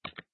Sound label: button